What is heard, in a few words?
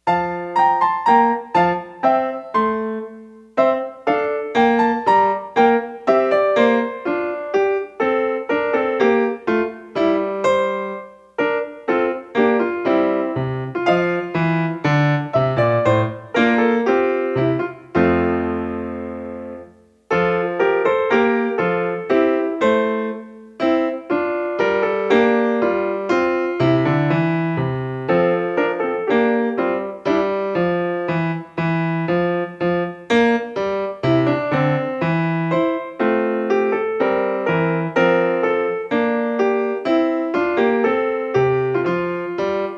BASS - accompaniment; piano accompaniment